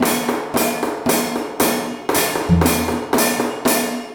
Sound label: MALAY R, Heart of Asia